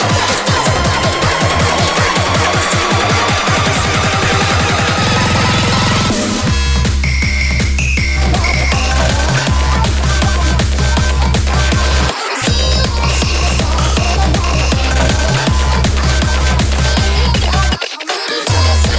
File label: Bass Drop